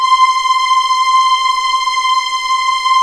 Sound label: C6 LEG F, VIOLINS; East West; The Ultimate String Collection